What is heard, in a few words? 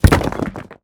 ram hit building